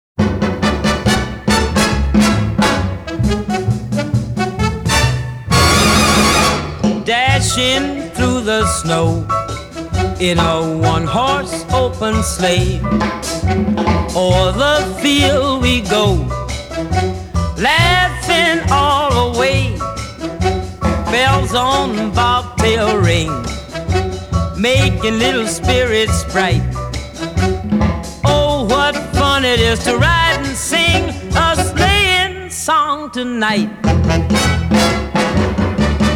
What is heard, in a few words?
jingle bells cha cha cha